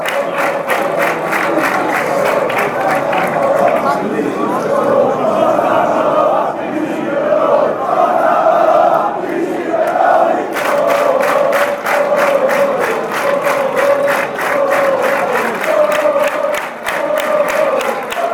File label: stadium, chants